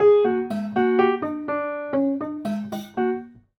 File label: Prepared Piano